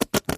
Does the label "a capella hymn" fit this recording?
No